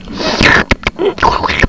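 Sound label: SLURP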